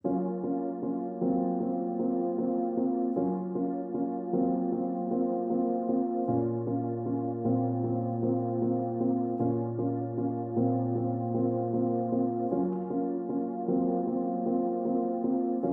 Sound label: 77 BPM A# Min Piano; RnB; Loop Stems; Cymatics; 2022 Melody Collection; RnB Pt 1; City Lights